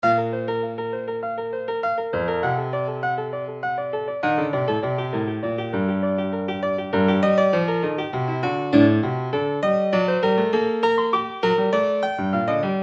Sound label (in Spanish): Juego de estrellas, acordeon